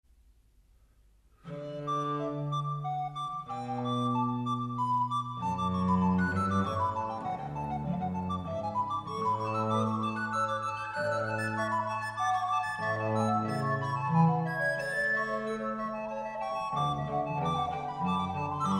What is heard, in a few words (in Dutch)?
Bach orgel triosonate Es deel 3; Johann Sebastian Bach (1685-1750); Orgeltriosonate in Es; Allegro